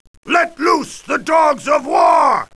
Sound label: Death Chant